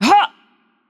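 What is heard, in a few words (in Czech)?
Attack Heavy, Czech; VO; cs CZ; bloom minigame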